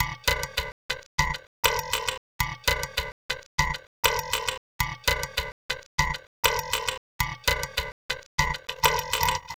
Mbira Echo, Acoustic Beats; Sonic Foundry (Sony Creative Software); Myths of Technology

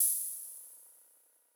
CYMBAL, Sound-Master SM-8

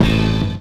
Hit, Percussion; Beat Components

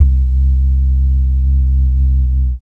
bass dums; Spectrasonics Vocal Planet; Jazz FX

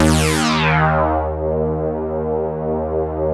LEADS; Masterbits; Vintage Voltage

